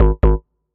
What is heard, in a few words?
error